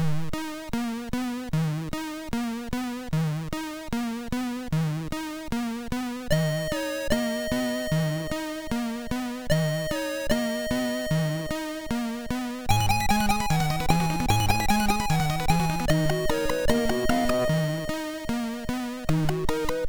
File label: sid MOS6581R4; Bruce Lee II Preview